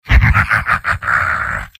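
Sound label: laugh evil, male; soldier; tf2; garrysmod; mvm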